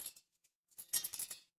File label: glass shatter